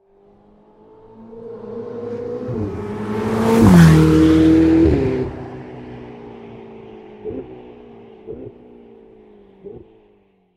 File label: Ferrari New V8 Fast drive by exterior